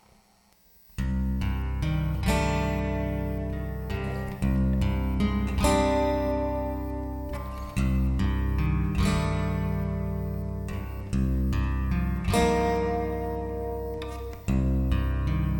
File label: piano solo; Soundtrack Music; Instrumental Samples from Solo CD